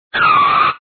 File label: car stop; screamin upstreamin